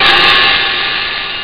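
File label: open Hat Reverse; jazz jackrabbit 1; M8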